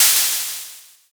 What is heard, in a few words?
open hat